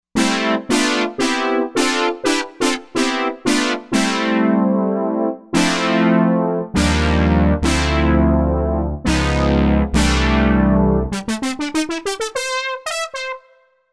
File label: Brass Ana Brass